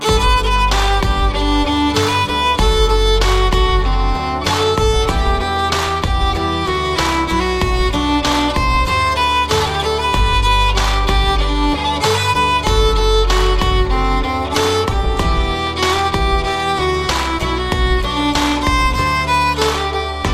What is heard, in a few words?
violin cover